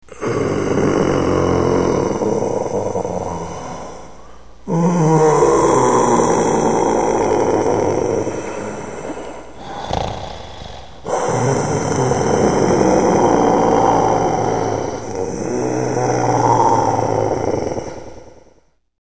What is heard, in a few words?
moans